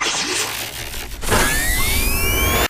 spectre overload close; Titanfall 2

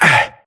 grunt hits; Titanfall 2

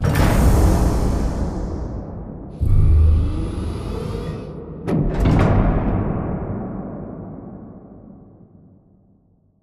Giant Floor Panel Lower Rotate Mech; Boomtown; Sideways Town; Titanfall 2

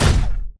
Footstep Jog Close; Titanfall 2

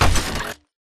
footstep shuffle; Titanfall 2

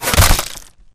bullet generic head kill shot human; Titanfall 2

